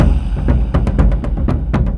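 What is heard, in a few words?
Music Shield; from dust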